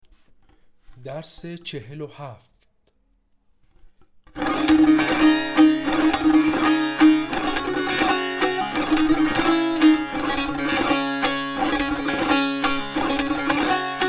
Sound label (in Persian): مشق تنبور